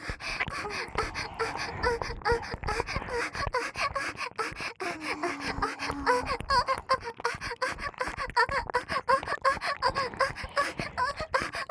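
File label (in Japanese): 激しい演技喘ぎ＋両耳舐め; 美冬; 小春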